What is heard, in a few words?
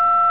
TONE